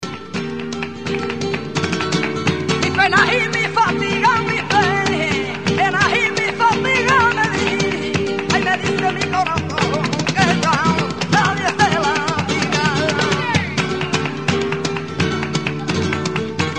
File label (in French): espagne flamenco